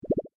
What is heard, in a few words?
Beep Electronic Bubbles Sequence